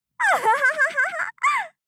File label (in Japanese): あははははっ